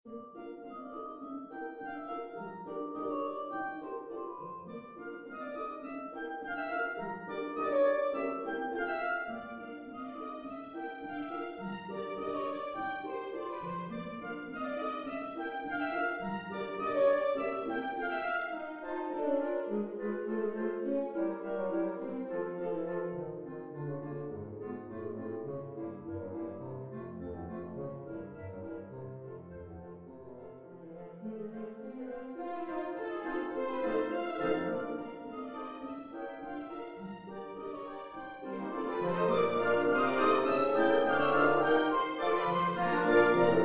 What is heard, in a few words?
polka orch